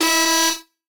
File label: Large Alarm Single Slow Loop; David Dumais Audio; Alarms Sound Fx Pack 1